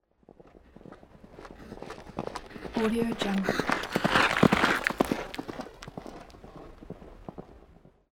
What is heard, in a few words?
horse passing by